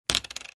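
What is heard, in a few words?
game of checkers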